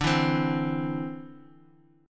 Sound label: D 7th Suspended 2nd down, chord